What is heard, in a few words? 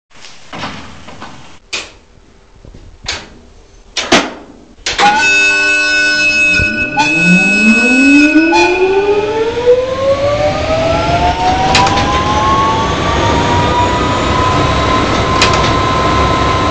ELok RENFE E1000; Roco; E1000 de Roco; ESU